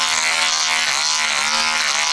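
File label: bee engine; vehicles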